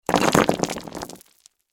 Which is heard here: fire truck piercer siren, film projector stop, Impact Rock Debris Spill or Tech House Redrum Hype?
Impact Rock Debris Spill